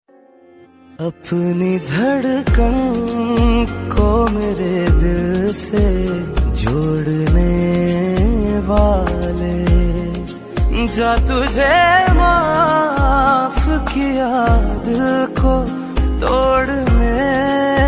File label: ringtone, male version